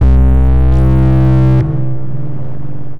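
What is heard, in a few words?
synth bass, drum and bass, 160 bpm, C; eJay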